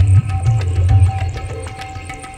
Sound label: Ambi Groove